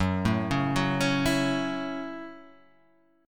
Gb Minor 7th, Gbm7 chord; arpeggiated; JGuitar

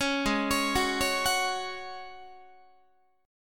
Ab 7th Suspended 4th Ab down Guitar

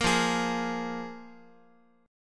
F Minor Double Flat 5th F Guitar; Fmbb5 strummed; JGuitar

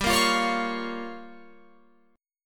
G Minor 7th Flat 5th, Guitar Open F

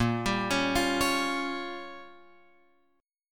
Bb Minor 6th, Guitar, Open F; arpeggiated; JGuitar

